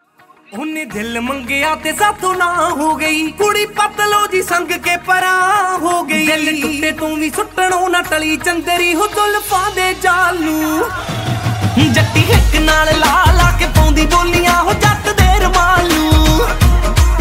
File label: Ringtone, Punjabi